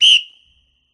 Sfx Basketball

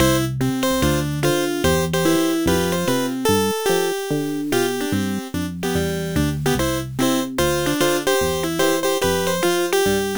Playful; Putt-Putt Joins the Parade; 3DO, Android, iOS, macOS, MS-DOS, Windows

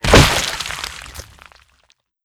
Gore Explode

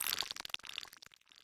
Juicy-Glitch

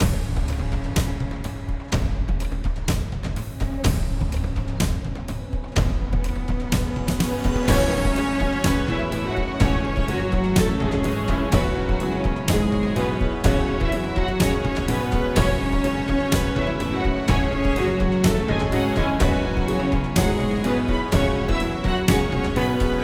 Music Intro; The Brink

